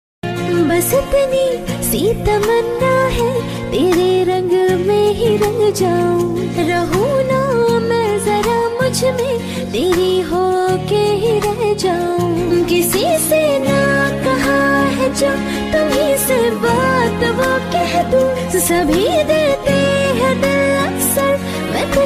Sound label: female version ringtone; bollywood